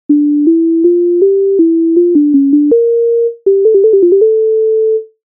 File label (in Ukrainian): тема фуги №6, MIDI файл завантажено в тональності d-moll; Bakh